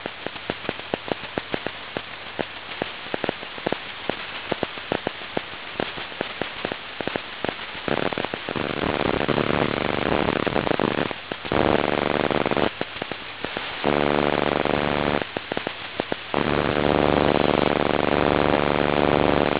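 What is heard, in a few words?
fhss, Scan samples of different Transmitter types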